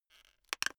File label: plastic slowly highly pressured